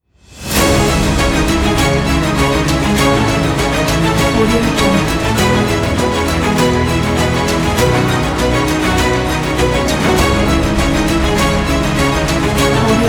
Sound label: epic intro cinematic logo pack; audiochameleon